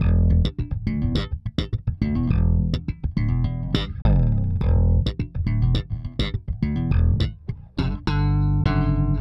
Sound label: Slap, Bass; Bass X